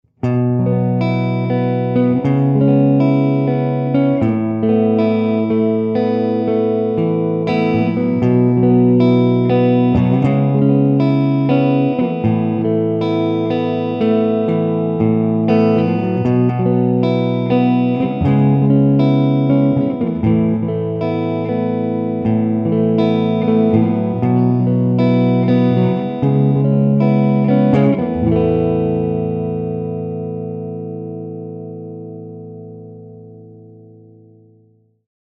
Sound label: GSP1101 clean; digitech gsp1101